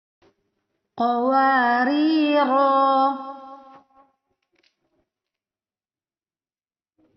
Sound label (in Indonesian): Perekaman baru Karaoke denoised